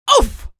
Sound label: pain, female